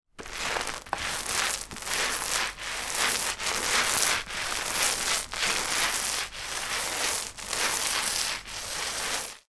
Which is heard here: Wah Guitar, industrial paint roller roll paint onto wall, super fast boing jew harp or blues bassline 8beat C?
industrial paint roller roll paint onto wall